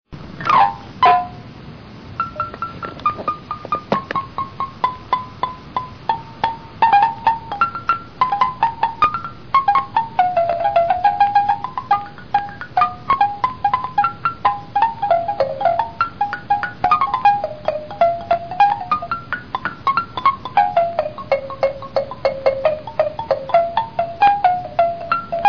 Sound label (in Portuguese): batida